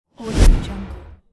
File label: short swoosh